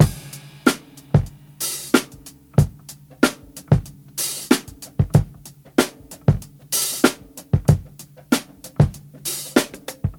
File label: 94 bpm hq breakbeat sample g key